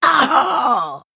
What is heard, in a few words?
Female Tortollan Death